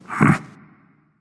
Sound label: thinking, voice; Smite; ymir skin14